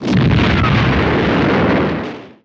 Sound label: nuke; SuperPower 2 Redux V7A